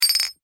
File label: Metal Impact Foley Small Bounce, Metal Impact Foley Small Metallic Bounce Household; Materials; BBFX